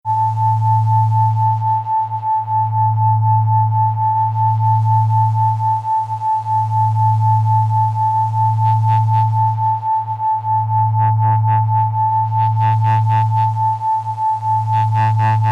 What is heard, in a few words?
sine harm dist